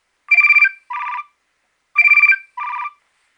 nokia3100 ringtone

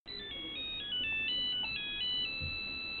nokia 1610 ringtones nokia tune

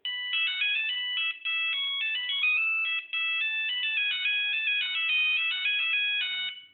nokia 1100 menuet ringtone

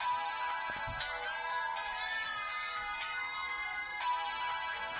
samsung galaxy y ringtones

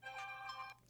motorola krzr k1 ringtones

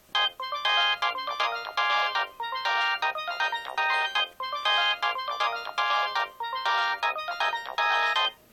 samsung sghe700 ringtone

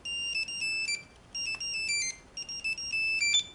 nokia 3210 salsa ringtone

